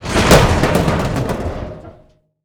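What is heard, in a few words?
explosion metal barrel detail; Explosions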